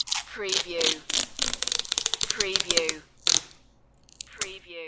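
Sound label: Masking Tape Long Pull Rip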